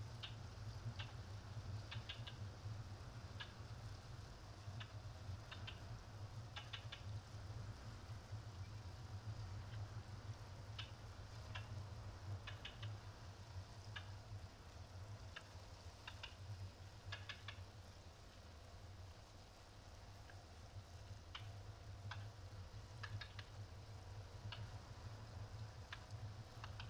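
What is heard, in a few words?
hammer killer whale playback; hammer-kw playback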